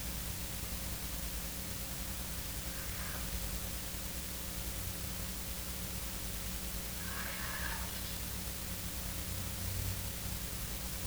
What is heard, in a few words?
whispers